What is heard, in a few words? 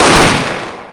shots; dmc; dante